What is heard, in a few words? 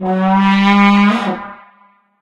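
vuvuzela; source engine; tf; football manager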